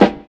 LO FI SD, Drums; USB Soundscan vol.11; AKAI

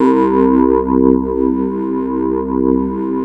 PPG C2, OLD PPG; Sound Gallery - Charged

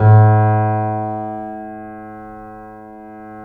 C7 PP, The Ultimate Piano Collection; East West

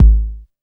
bass drum deep, techno trance drums

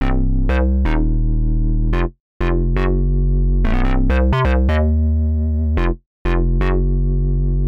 125 bass C; Basses; PowerFX - Nu Jazz House

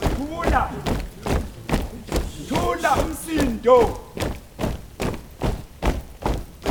HUM RUNIN, Foot & Mouth; Roland LCDP14 Africa VOL-2

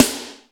SNARE FRESH; E-MU Formula 4000 Series Vol. 1; Hip Hop Nation

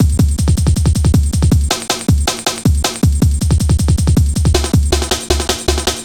Drum Loops, 158.5; Jungle

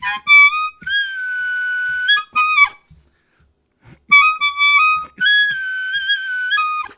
High Squirt, Harmonica Riffs; Zero G

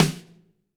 Snare, Roland TD-20

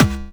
BD, Dance Mega Drums, BD HOU; Best Service

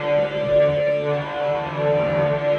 Long Burn, Elektrik Drone, One-Shot Beds; Sonic Foundry (Sony Creative Software) - Friendly Fire; DroneLoop

